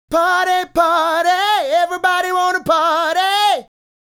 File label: Male Solo, Hip-Hop and RnB Vocals